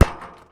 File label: Street Ball Metal Back; SFX Kit; Street Basketball